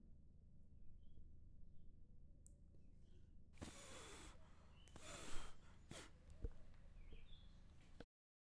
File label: Blowing Out Candles